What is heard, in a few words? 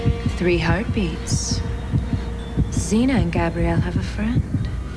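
3 heartbeats; nec evil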